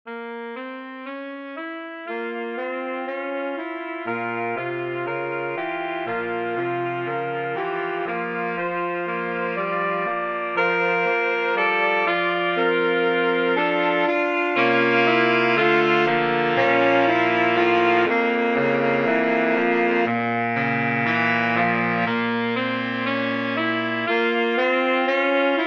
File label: Canone E Giga, MIDI